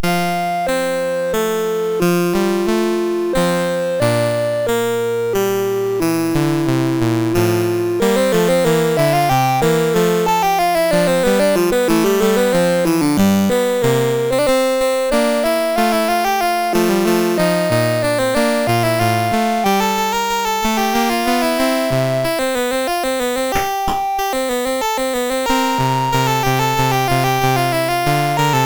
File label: Adagio Sonata in F Major, MOS 6581; MOS6581R3